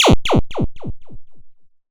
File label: decaying pewpewpew